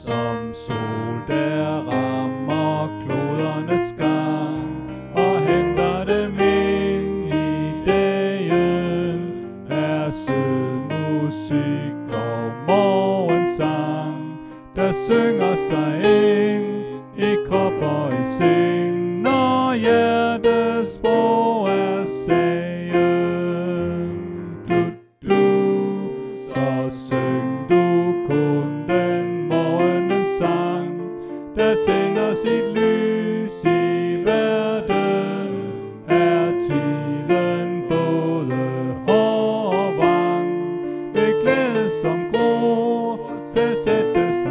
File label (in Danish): Sopran; Som sol der rammer klodernes gang; oevefiler